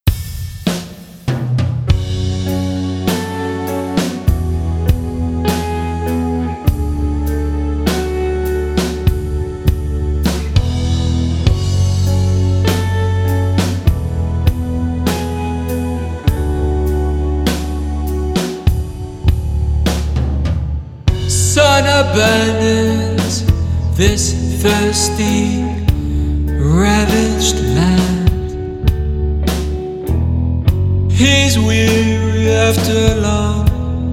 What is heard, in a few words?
song snippet, pop rock; solo music artist; Voice of Chicago